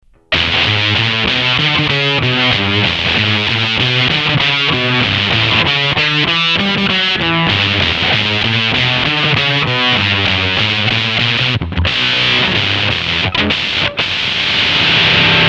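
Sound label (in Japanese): gate fuzz, Gate fuzz ガミガミと怒鳴るようにアンプがぶっ壊れたようなサウンド。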